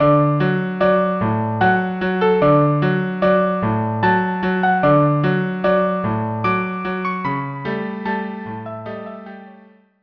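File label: violin cello duo; faust waltz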